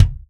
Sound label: Real Drum Kit, ROCK KIT; Best Service ProSamples vol.43 - Real Drum Kits